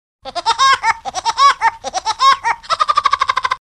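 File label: woodpecker laughter; dyatel vudi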